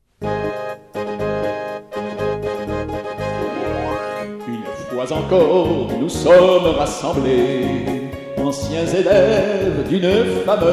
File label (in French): Hymne